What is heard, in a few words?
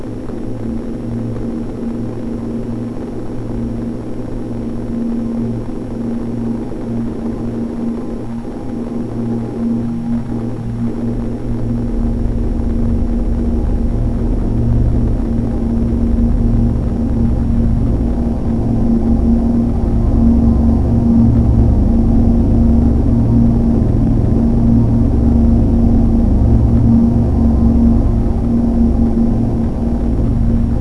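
training music; svencoop; AoMDC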